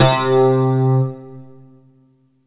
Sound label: Ensoniq SQ-1 Road Time C3